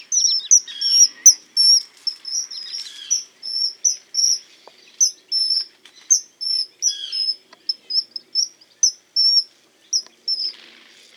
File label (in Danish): Isfugl Alcedo atthis